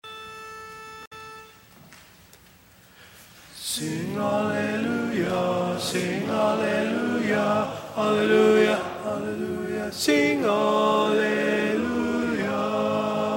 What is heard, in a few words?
O Holy Child Lead